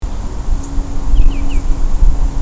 gruenschenkel; Mag. Peter Holub

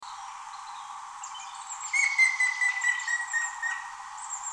grauspecht; Mag. Peter Holub

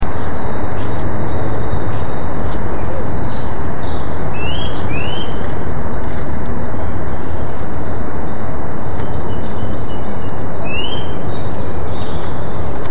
kleiber; Mag. Peter Holub

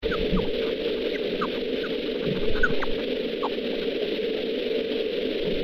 fransenfledermaus; Fledermäuse - Rufe transponiert; Mag. Peter Holub

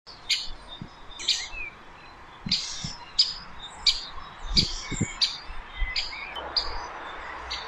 buntspecht; Mag. Peter Holub

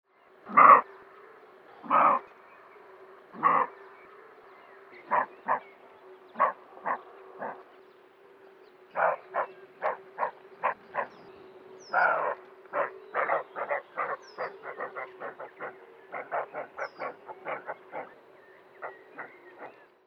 rehbock schreckt; Mag. Peter Holub

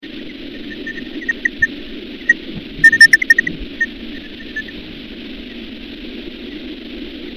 mückenfledermaus; Mag. Peter Holub

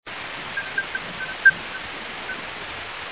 zwergfledermaus; Mag. Peter Holub

